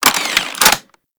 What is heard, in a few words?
Anti Aircraft Gun Un Equip; Weapons; Warframe; Archwing